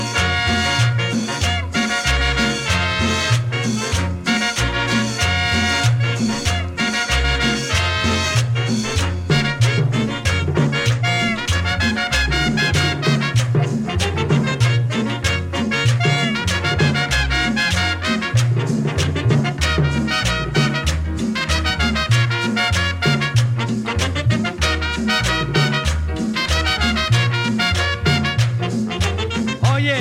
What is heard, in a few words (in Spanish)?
Cumbia Sabrosa